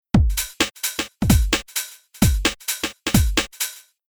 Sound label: Rhythm 2Step